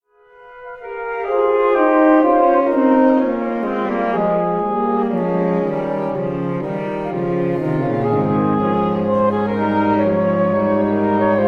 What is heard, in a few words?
Sax Quartet